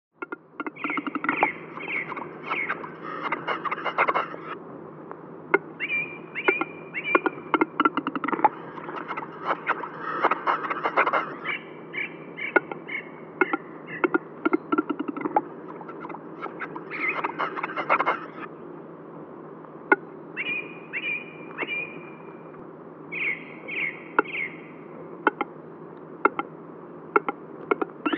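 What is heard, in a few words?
gluhar golos, capercaillie voice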